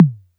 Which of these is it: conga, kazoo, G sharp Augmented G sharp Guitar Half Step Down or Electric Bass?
conga